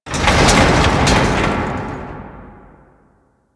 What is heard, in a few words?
Metal Glass Rattle; Warframe; Ambience; Entrati